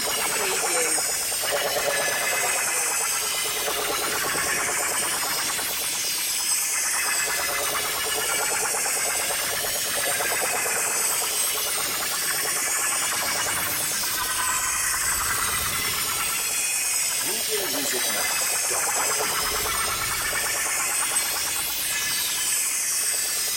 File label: flapping space ambience loop